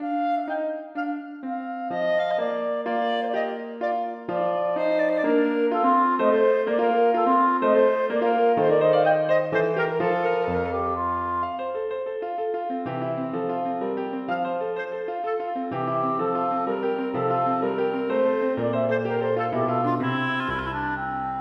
Allegretto fra sonate for klarinett og piano; 3. sats fra cembalosonate nr 2 - 1779; Giuseppe Sarti

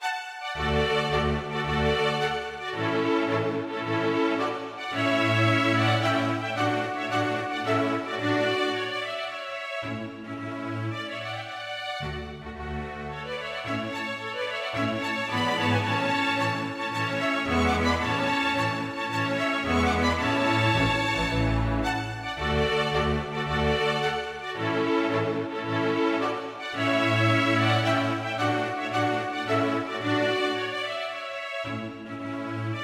Marsch for strykekvintett og ad lib trommer, u trommer

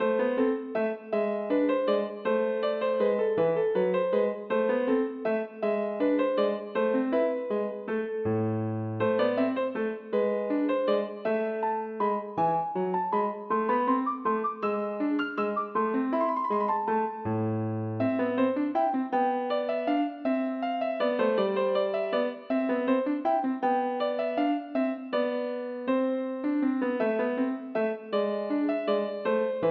Menuet for piano; Aamodt

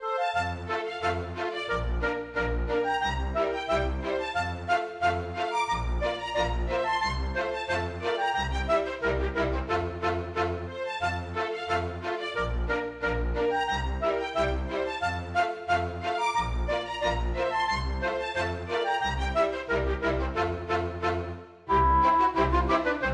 Polka; storgårdsmusikk

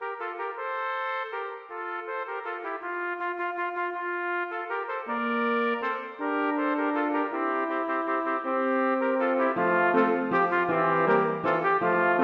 Menue for to trompeter, horn og piano

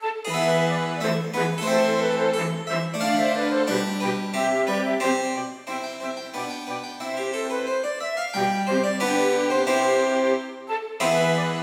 Gavott en boure for fløyte, fiolin, cello og cembalo; Hertz

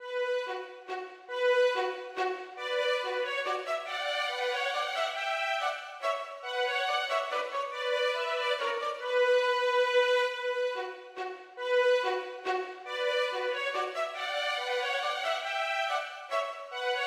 Menuet for fiolinduett; Aamodt

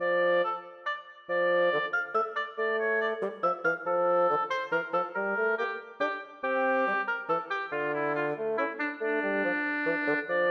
Menue for obo og fagott; Bang

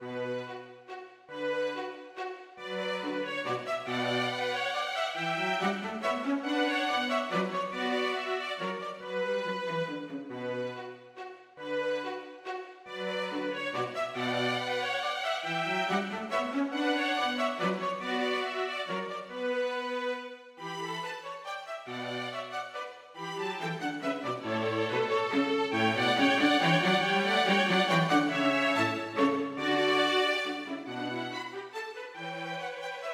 Menuet for to fioliner og cello; Aamodt

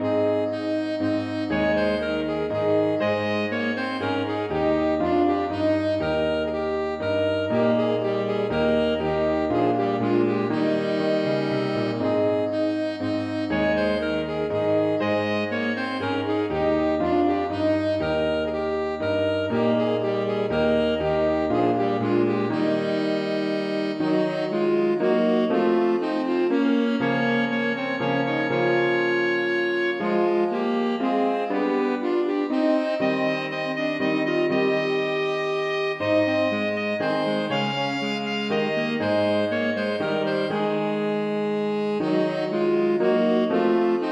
Menuet for altsax, tenorsax og piano; Aamodt

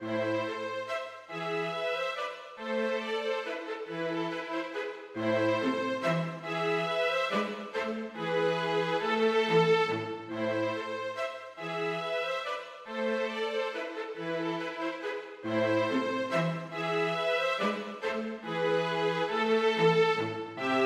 Menuet for to fioliner og cello; Aamodt

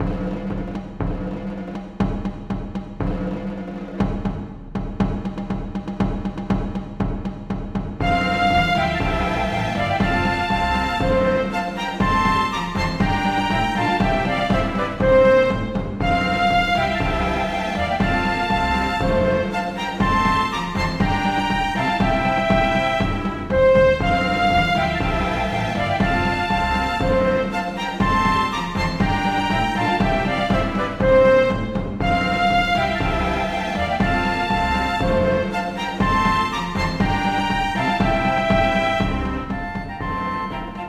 Marchs for fløyter, strykere og trommer; Bang; Marchs de Brandenburg

